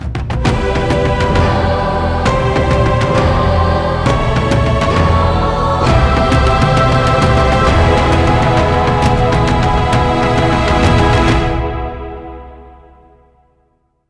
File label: Victory